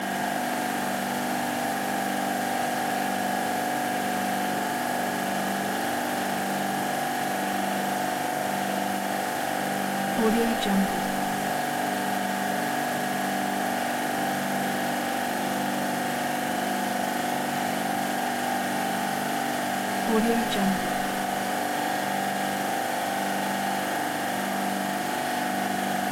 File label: machines industrial compressor ice rink loop; blazznet